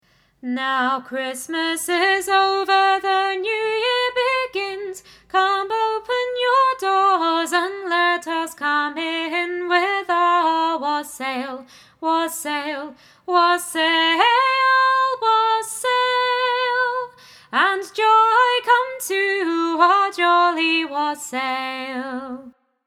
Wassail Song Melody

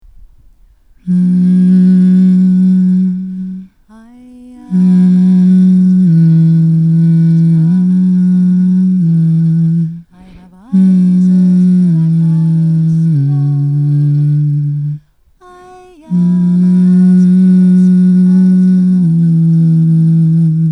The Brown Girl LOW HUMS; EFDSS

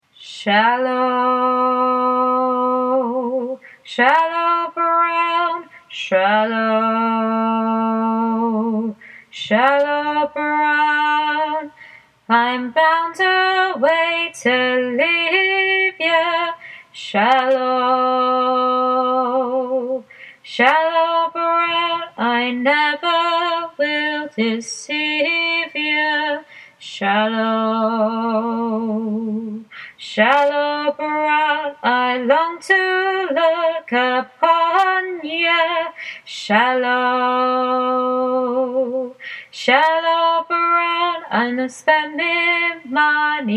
Shallow Brown Low Part

